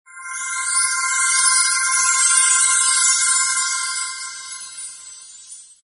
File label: magic spell weaver